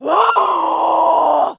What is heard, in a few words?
VOICE Female 36yo Scream Deep